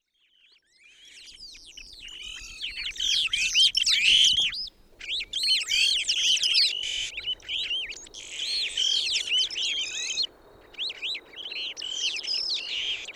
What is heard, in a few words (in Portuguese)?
Chopim-do-brejo Pseudoleistes guirahuro; Jacauna